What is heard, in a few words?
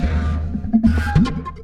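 Music Dpad 145 Maxisphere; from dust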